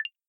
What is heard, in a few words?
send success